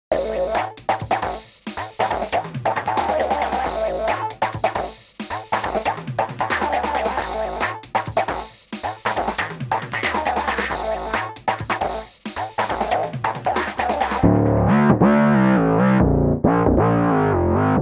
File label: Ringmod External; Behringer MS-5